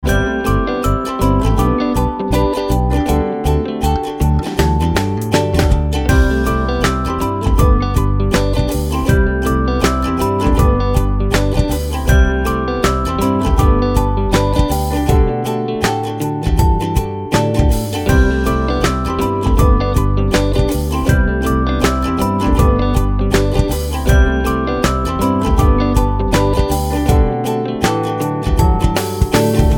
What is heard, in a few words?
HEY HEY UKULELE